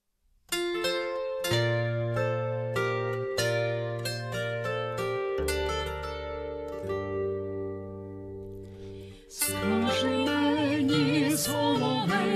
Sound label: Ukrainian baroque love song duet